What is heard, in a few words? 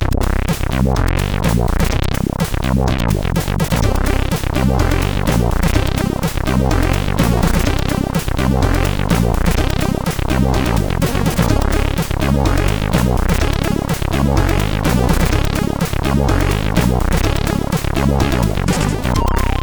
Acid Feelin, sid 8580; HVSC